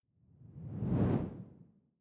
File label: whoosh simple incoming low; swooshes-swishes